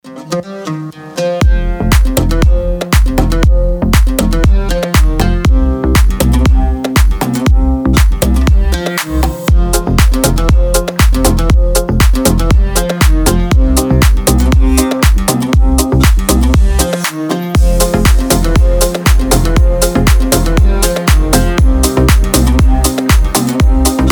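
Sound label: milaya gitarka, sweet guitar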